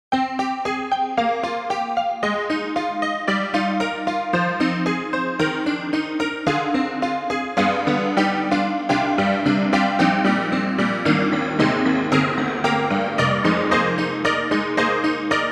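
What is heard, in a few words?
Honky Piano; Cakewalk; DropZone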